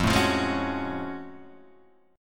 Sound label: F sharp Minor Major 9th F